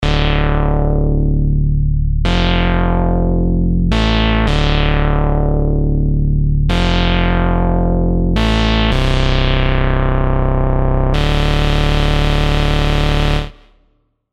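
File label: bass, Desync; synth wafers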